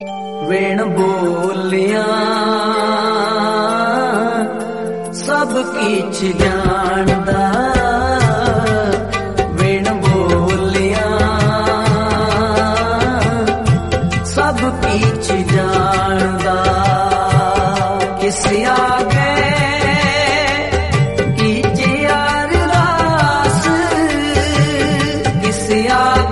gurbani ringtone

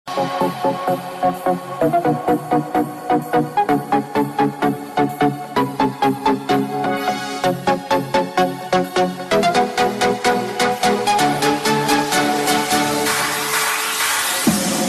electro music

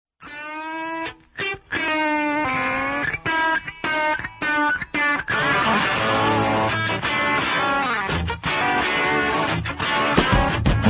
funk ringtone

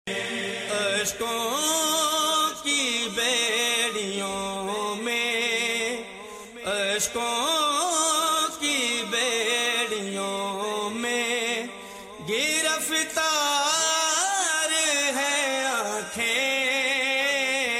noha ringtone

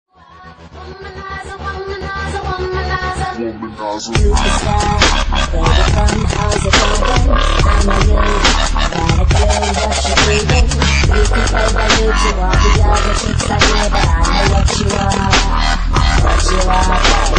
dubstep

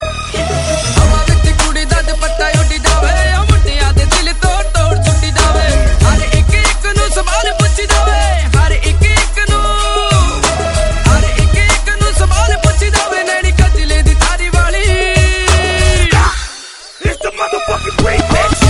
punjabi ringtone

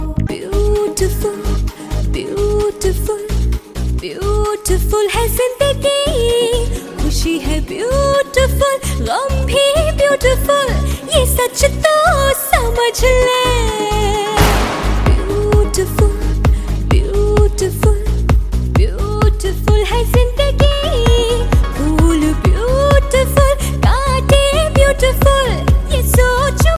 beautiful ringtone, bollywood ringtones

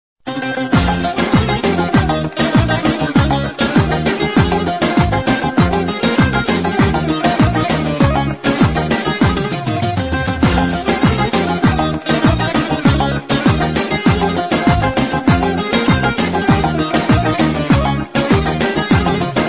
arabic music tone

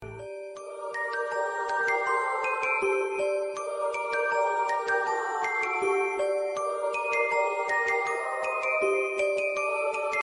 iphone3 dhing ring ringtone

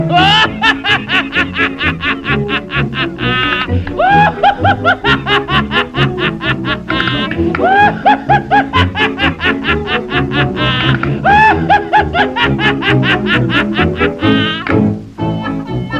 ringtone, funny ringtones

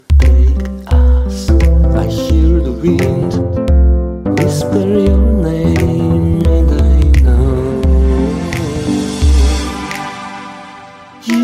emotional ringtone, english

